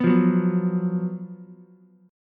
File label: Gb Major 7th Suspended 4th Gb Ukulele; GbM7sus4; strummed; 4,5,2,2; JGuitar